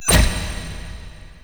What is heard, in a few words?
Vaults Stairs; Gameplay; Warframe; Entrati